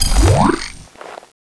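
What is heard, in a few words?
patrol drone deploy; weapons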